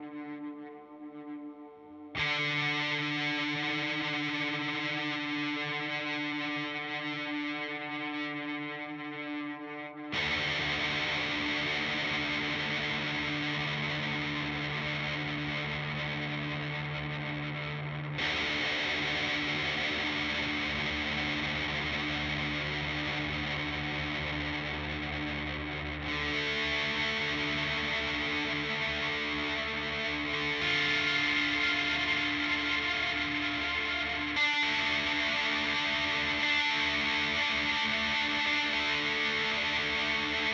Walrus Audio Melee D R 2 long decay

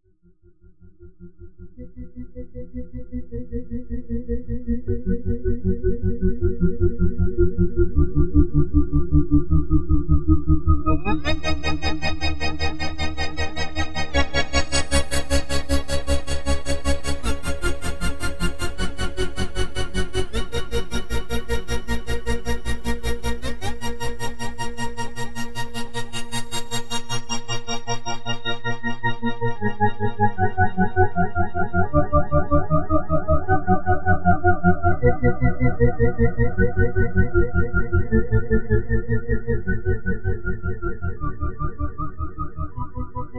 Alpha Juno 2 Ext Lfo Expr Ped

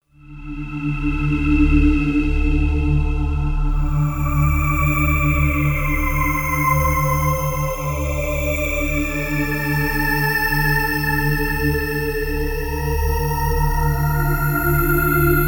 Pad; Gravy

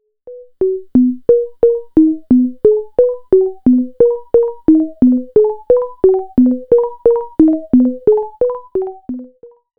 Behringer Space FX B2500 Filterping Pitch 1

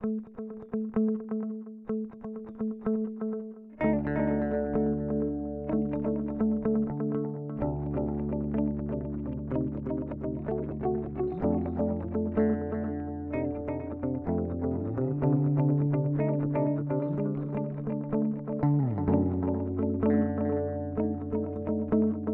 Eventide H9 Dark Delay

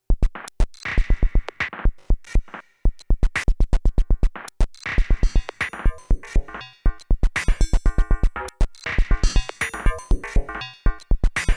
Korg drumlogue

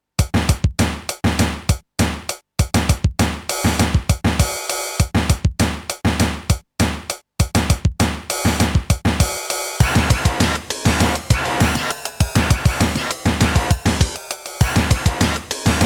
Drumtraks weird percussion

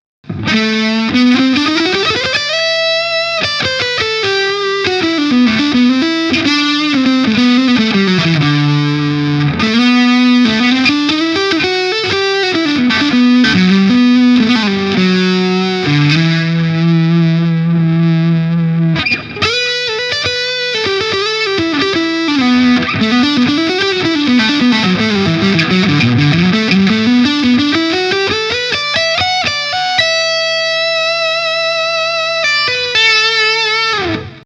Custom 22 Steg Hals verzerrt; PRS S2

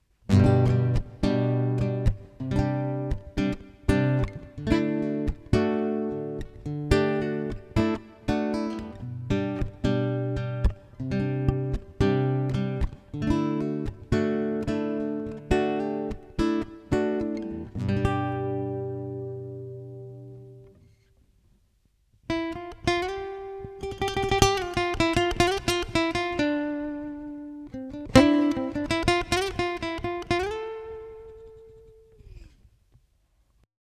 Reverb Nylon Guitar; Prussian Blue

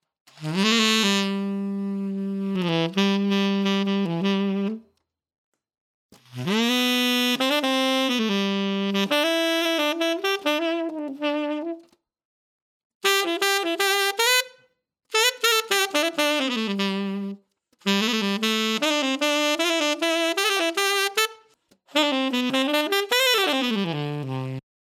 TenorSax

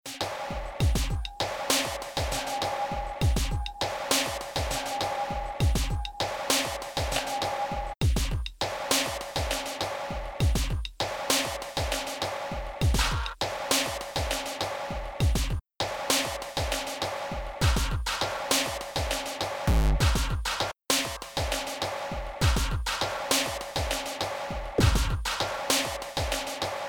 NI Battery 4 terrorcore kit

